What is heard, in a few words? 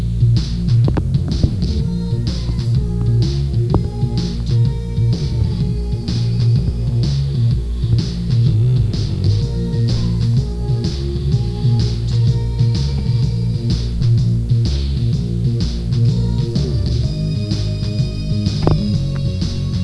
frapp electric